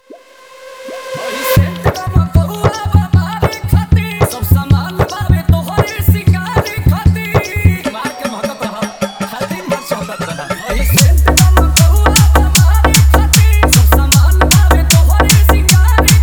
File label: Bhojpuri Dj Remix Songs Edm Trance Mix